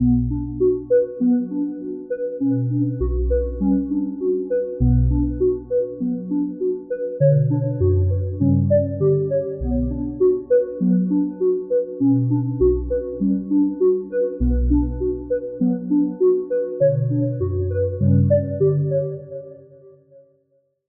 lead whistle, Sylenth1; Routed Sound; Sylenth1 Expansion - Street Vanquisher Vol.1

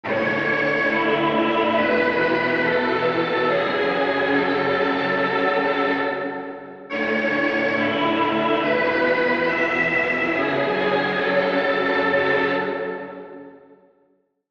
Lead; Red Ashes; Routed Sound; Vital Expansion - Flame Summoner Vol.1

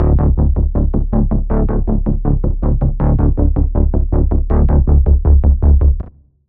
Pulse

